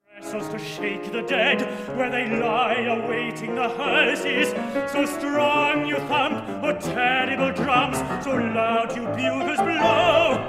beat beat drums

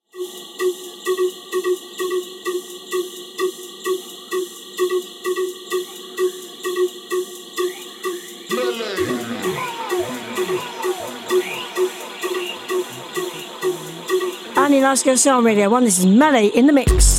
flute tune

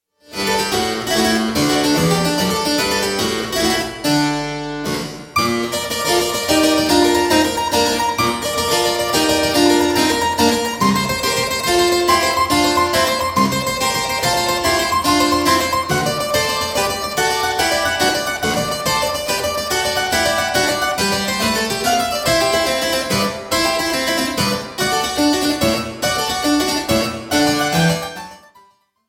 sonata no 43 in g major for keyboard; padre antonio soler; richard lester; r43

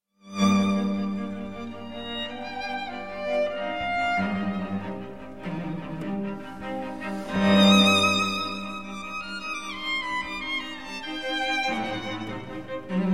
string quintet in D major; wolfgang amadeus mozart

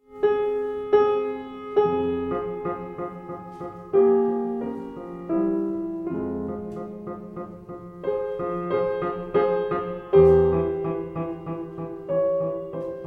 piano sonata no 10 in C K330; wolfgang amadeus mozart

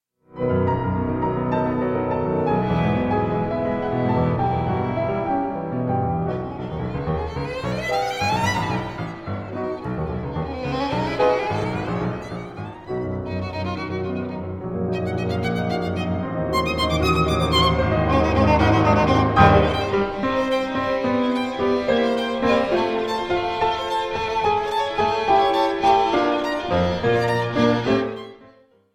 symphonic poem for violin and piano; danse macabre; vers for violin and piano

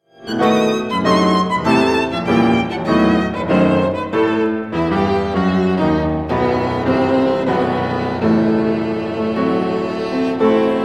sonata in e minor op 82 for violin and piano

